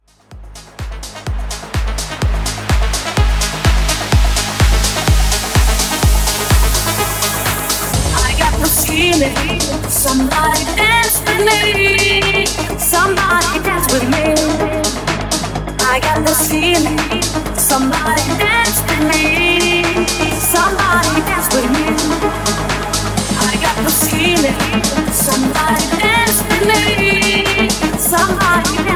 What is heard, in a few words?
Festival 126 Bpm